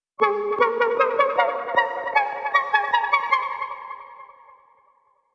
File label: Space Synth Wet, 155 bpm, A- minor